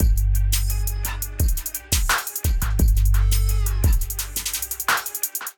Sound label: Psycho Kit groove 86 bpm; MIDI Clips; Ableton; Factory Packs; Beat Tools